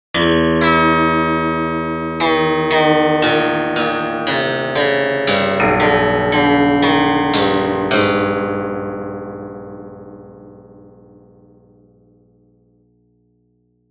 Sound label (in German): saite